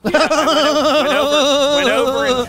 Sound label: look at this laugh